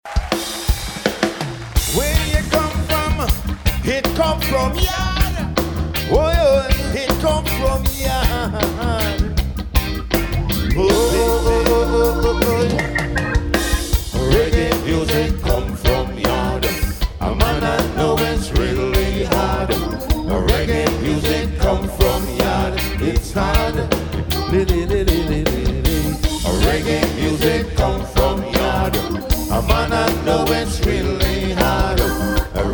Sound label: Reggae Yard